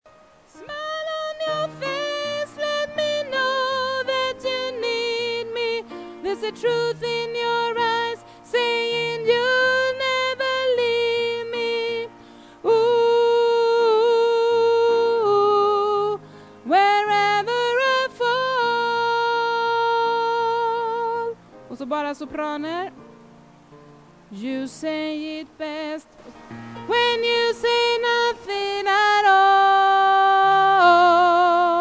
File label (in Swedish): tenor, stammor